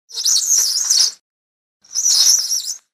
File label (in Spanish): Chirrido largo; Banco de Sonidos - Facultad de Art; Leitao - Cazanave - Capelluti - Suasnabar